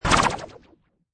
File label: Magical Descend Sweeper Fast, Magic sound of casting spell, conjuring or wizardry